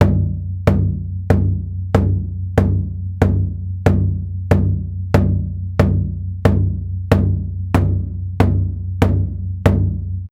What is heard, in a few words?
Big drum; Nightfall (Tabbyewik)